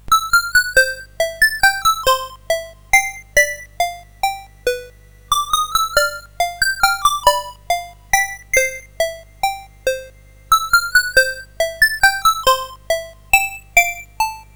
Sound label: Music Box, CSG8580R5